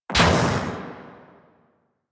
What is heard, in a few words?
Grenade Explosion; Warframe; Caliber Chicks minigame; bomber